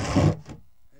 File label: BOX SLIDE, Box Noises; E-MU Producer Series Vol. 3 – Hollywood Sound Effects; Ambient Sounds